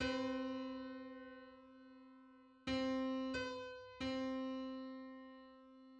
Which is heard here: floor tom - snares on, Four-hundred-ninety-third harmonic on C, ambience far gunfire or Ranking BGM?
Four-hundred-ninety-third harmonic on C